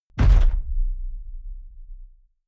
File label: sci-fi gun mechanic fire internal; pistolen gewehre